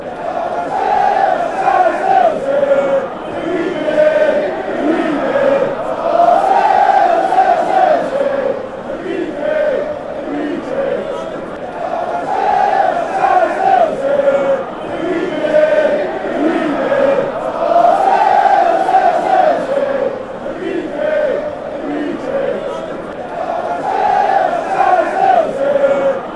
liverpool song, fanchants

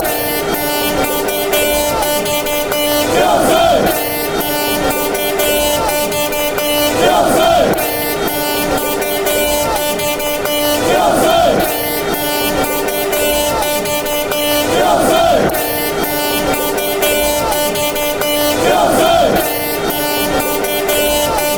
horn, fanchants; chelsea

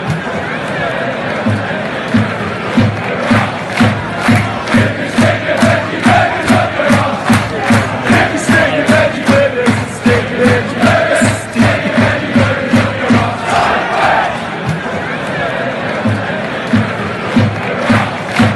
derby chant, fanchants; vegan free

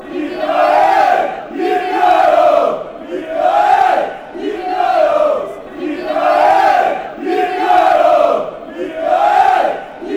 yippy aye ay, fanchants

